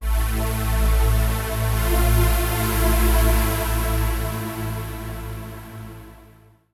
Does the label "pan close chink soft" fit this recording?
No